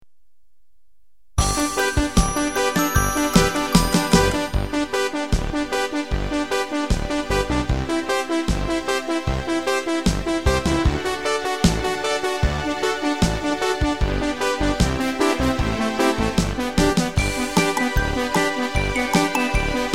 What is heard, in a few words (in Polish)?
wersja instrumentalna